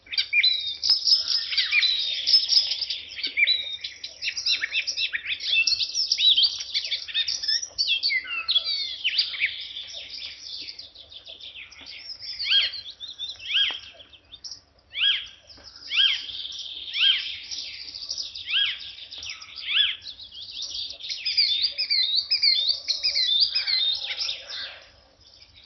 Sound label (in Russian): zelenaya peresmeshka golos